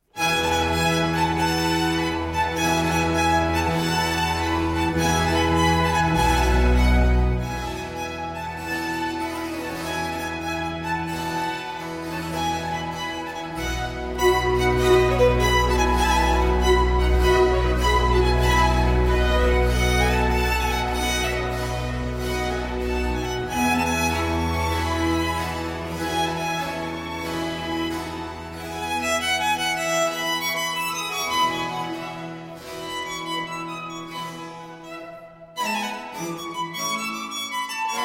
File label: Violin Concerto in E Major, Danza Pastorale Allegro; RV 269, La Primavera